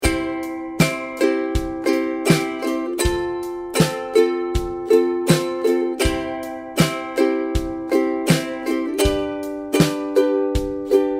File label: strum, ukulele